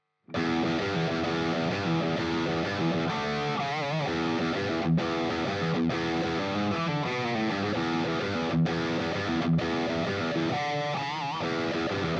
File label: HSS Bridge Drive; electric guitars; Gusta GST 05 HSS; gst models